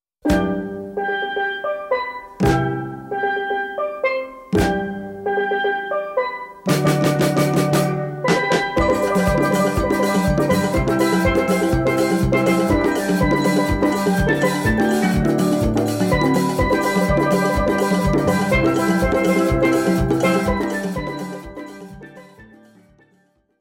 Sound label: Calypso Steeldrum